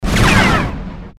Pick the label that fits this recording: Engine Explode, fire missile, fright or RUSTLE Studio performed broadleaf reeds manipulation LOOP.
fire missile